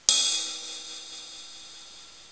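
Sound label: Cymbal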